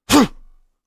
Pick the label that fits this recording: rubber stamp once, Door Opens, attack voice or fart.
attack voice